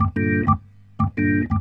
Roots Reggae, B-3; Sounds Good

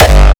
hardcorestyle kick attempt

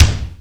SY35, Yamaha Sy35, Drums

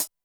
closed hihat, Zoom MRT-3B

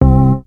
HIT, STABS, House

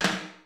KICK SNARE; Kid Nepro; Elite; KICKS SNARES

